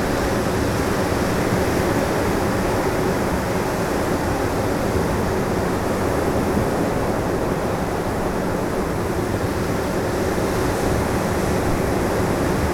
long slow waves

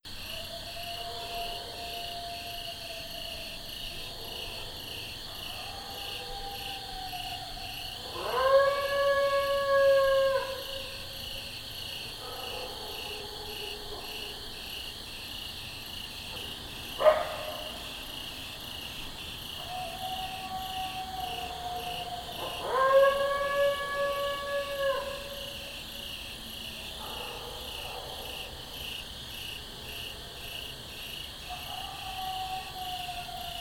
coy distant

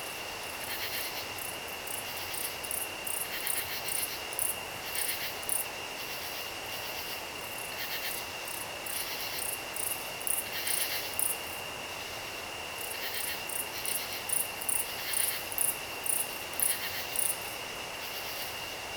august crickets

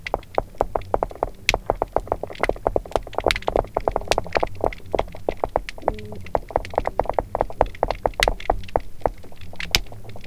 unknown knock, Unknown Underwater Sounds

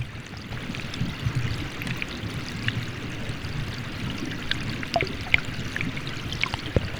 stream by falls

